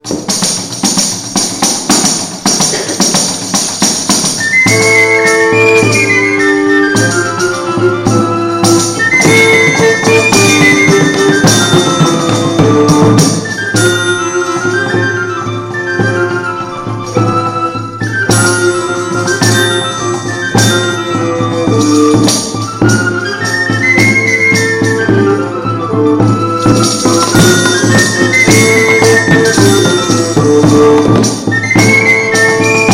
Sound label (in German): garkleinfloetlein